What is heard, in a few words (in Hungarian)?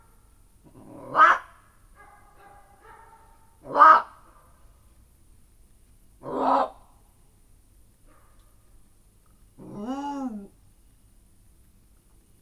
négy kiáltás, mocsári hiúz; miskolci zoo 2009